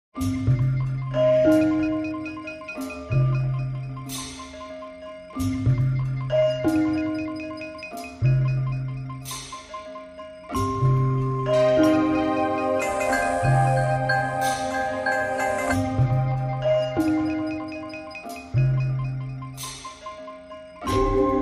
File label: No Rattling Bells